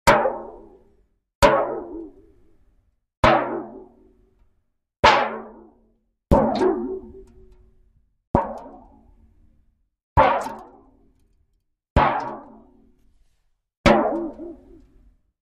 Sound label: Vessel, Aluminum, Hits, Some Water